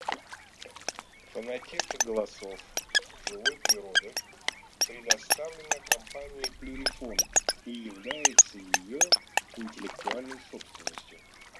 Лысуха Folaga

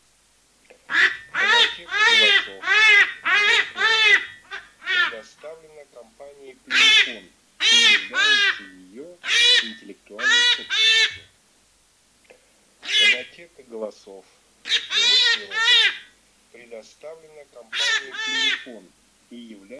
Заяц раненый Lepre Grido

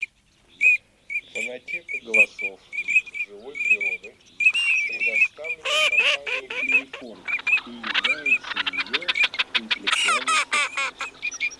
Чирок-свистунок Alzavola; Azerbaijan

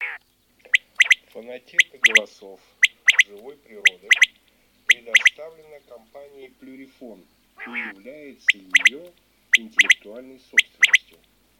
Перепел самка Quaglia; plurifon

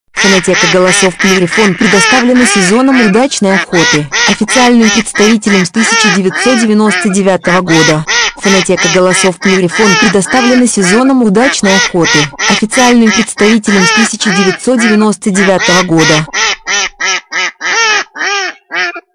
кряква; plurifon

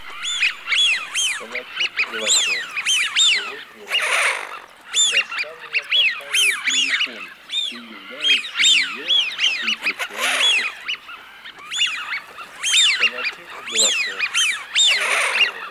Свиязь Fischione; plurifon

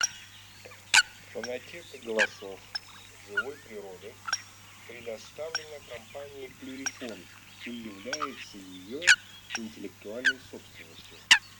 Лысуха Folaga; plurifon

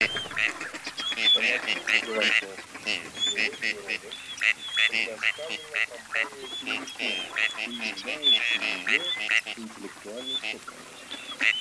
Серая утка Canapiglia; plurifon

